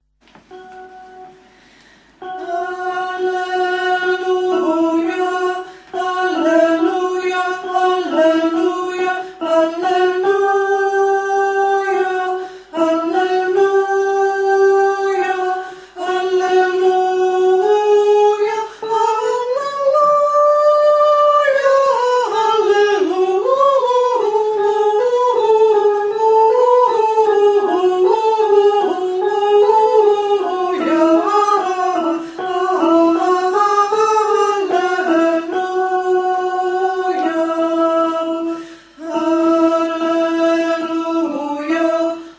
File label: a alleluja